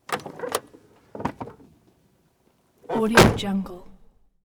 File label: 1986 cadillac de ville sedan; prosoundfx